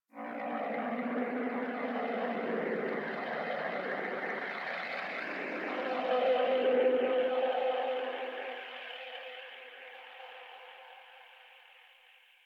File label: Landscape Wind Atmosphere Anomaly, Ambience; Warframe; Venus Open World; One Offs